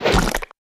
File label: Mud Glob Miss; The Battle for Wesnoth